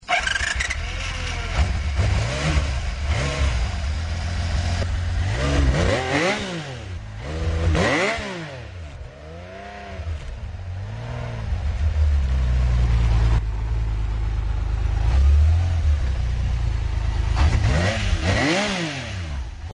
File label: honda cbx 1000